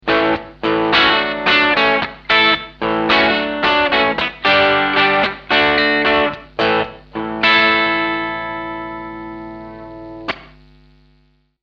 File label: bypass